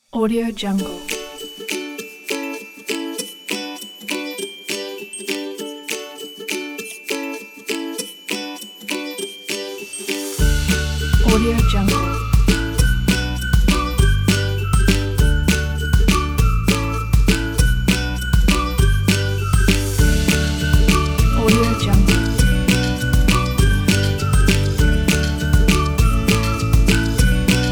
an happy ukulele

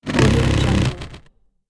rubber stretch; nektar pro

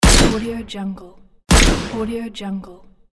rifle svd dragunov shot

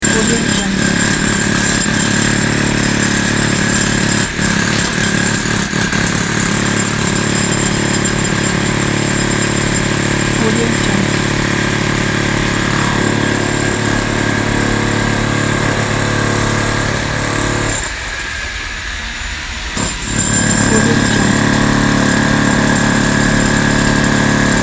jackhammer sound long

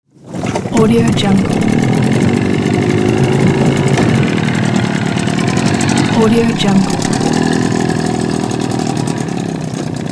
boat start and drive away

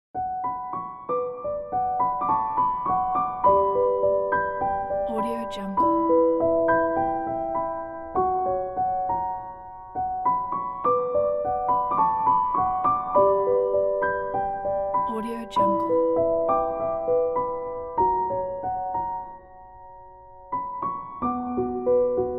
lyrical piano

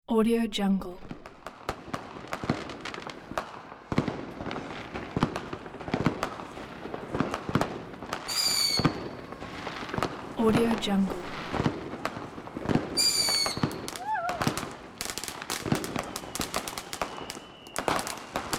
fireworks

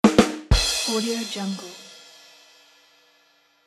comedy snare and cymbal hit

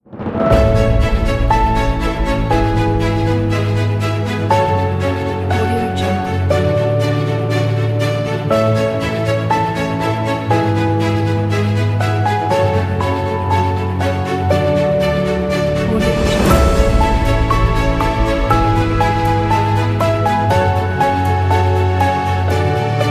the epic inspiring

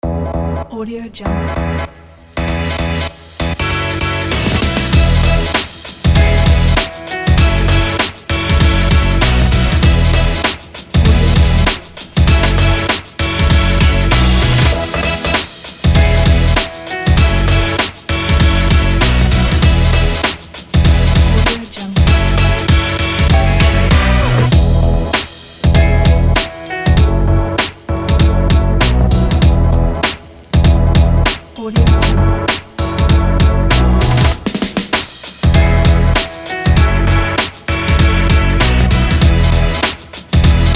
happy hiphop

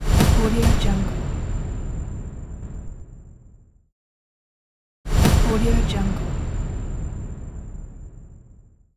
trailer impact

